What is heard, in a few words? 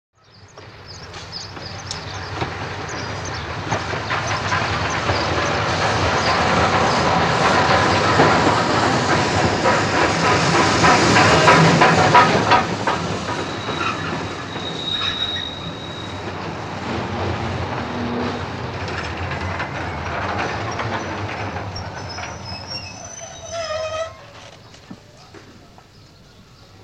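In Stop Away Steam Train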